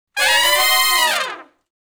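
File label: Fast Climb Up (Ab) harmony, Horncraft; Sections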